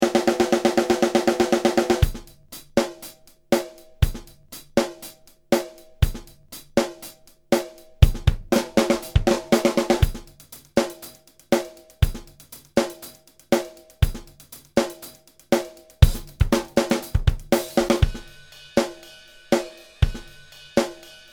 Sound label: Slap Funk Loop 130